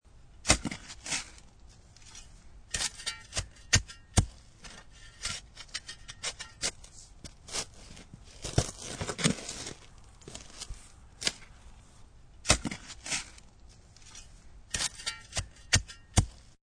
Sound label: Shoveling Sandy Dirt, High quality up close of shovel scooping and throwing sandy dirt; Yard Tools